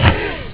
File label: cough